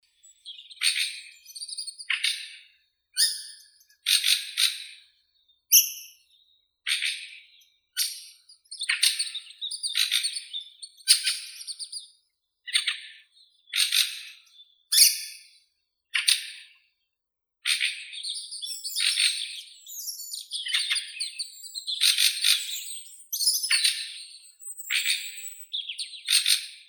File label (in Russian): drozd ryabinnik turdus pilaris